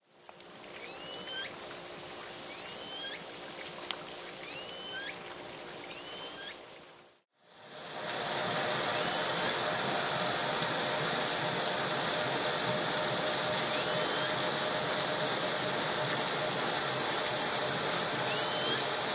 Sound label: Lpod S19 calls midnight after in ship noise; anthrophony; vessels; response to ship noise examples